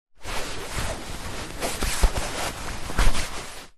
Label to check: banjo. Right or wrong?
Wrong